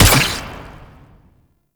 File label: Infested Burst Rifle Shot 2D, weapons